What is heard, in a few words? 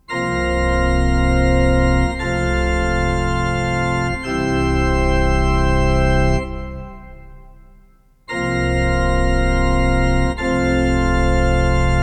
Choral Church Organ